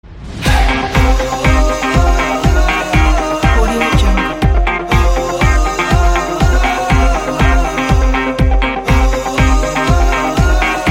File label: energetic upbeat action sports